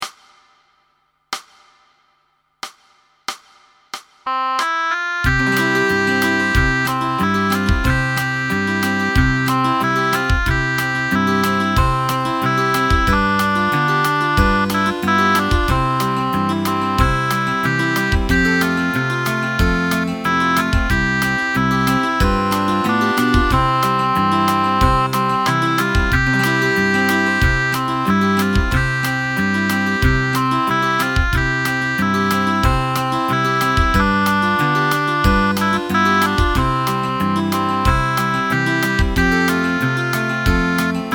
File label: saints basson